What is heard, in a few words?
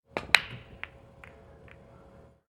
pool table break